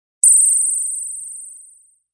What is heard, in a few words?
Ringer; Rollover or button sound perfect for web pages, flash animation, and other web applications.; Rings; Electronic